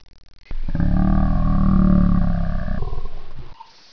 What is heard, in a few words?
death